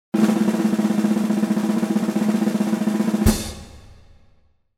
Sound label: snare roll perc hit